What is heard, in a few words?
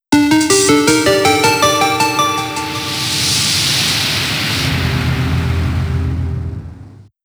Startup; Samsung; SPH-A800; Startup and Shutdown